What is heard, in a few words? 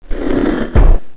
default beep; Diablo; Desktop Themes